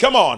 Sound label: VOX SHORTS; Megabass Remix! 2